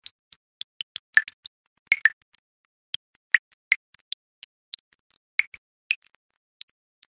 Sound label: water drips